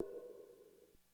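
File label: Hi Hat Open, Korg DSS-1 Drums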